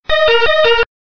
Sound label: Horn Sms